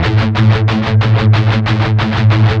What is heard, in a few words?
16TH A#2 L, Guitars; Spectrasonics; Hans Zimmer Guitars VOL-1; MED 16TH